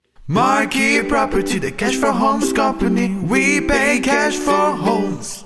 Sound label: jingle; markey property